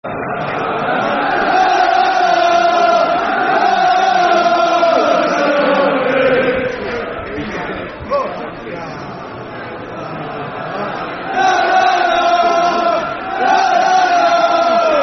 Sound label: La La, Football Chant, Soccer Songs; Southend; Southend United Sufc